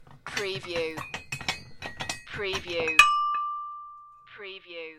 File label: Weights